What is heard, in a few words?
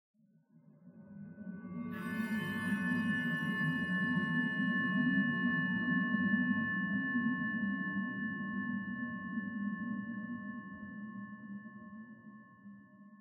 Bells Interior